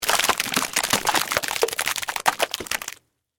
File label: Splash Long Splat, Splatter, Mud; Texture; Splashes